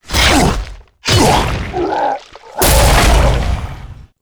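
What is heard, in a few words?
death; Elwood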